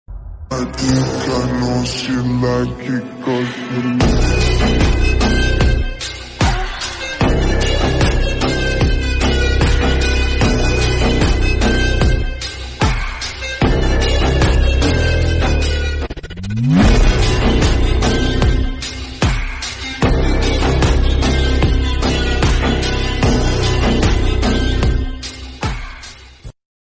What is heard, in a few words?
Bgm Ringtone